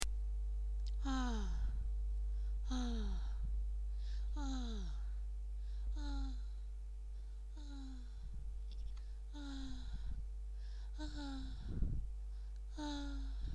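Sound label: sounds of female moans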